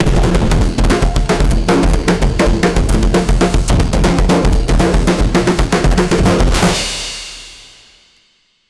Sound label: loud chaotic tribal drums